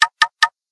Knock, notification; LG

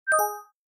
Swivel close, phone; Nokia; 7373 China

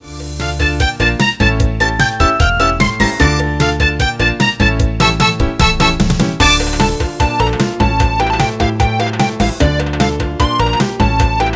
Latin, ringtones; ZTE; V790 KIS II T-Mobile

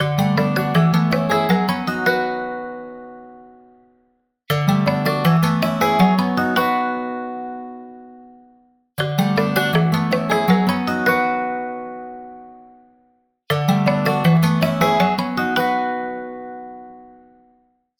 Ice Latte, ringtones; Xiaomi; POCO F1

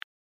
Keypress Invalid; Lenovo; ZUI 13

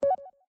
Video Record, ui; TCL; L10

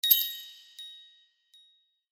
Crisp Email, Sleigh Bells; HTC

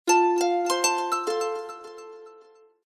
Harp, notifications; Tecno Spark 40C

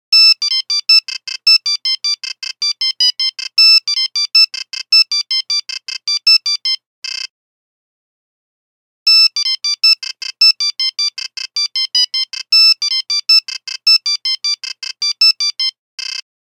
5110 Lamb, Nokia Buzzer; Windows Phone apps

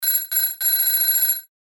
Telephone; Coolpad; ivvi-K1-mini

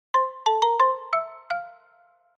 Message, notifications; Qiku; Luna Youth

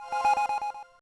cam stop; LG; SV770-Waffle

